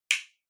Castanets

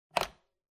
Telephone Hardware Receiver Pick Up

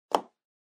Eraser Put Down

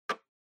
Clock Minute Hand Dry

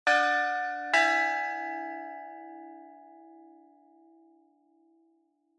Quiz Results

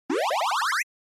Magic, NES RPG

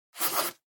Mechanical Pen Circle

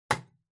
Stapler, Put Down

